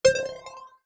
Multimedia Internet CD-Rom Flash Beep Mechanical

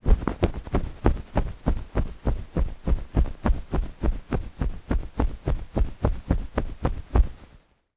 Pillow Fluffing, Household

